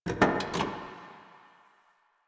Parking Lot Car Door; Warframe; Ambience; Nineteen Ninety Nine

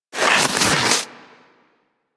Armadillo Roll Snow, Foley; Warframe; Hunting Critters

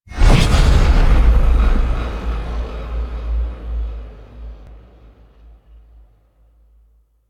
Transition; Duviri; Ui; Save Teshin

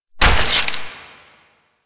Nagantaka Prime Shot 2D, weapons; Warframe

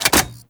Flamethrower Reload; Warframe; Weapons; Ember Deluxe II Flamethrower Skin

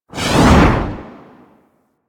Dodge Roll Right Low; Movement; Warframe; Archwing

